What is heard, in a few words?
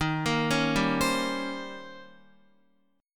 Eb 13th, Guitar; Eb13 arpeggiated; x,6,8,6,7,7; JGuitar